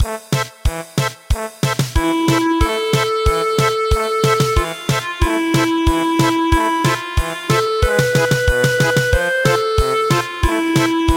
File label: German Polka; Bubuy bulan